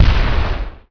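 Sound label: Explosion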